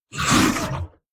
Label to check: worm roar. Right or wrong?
Right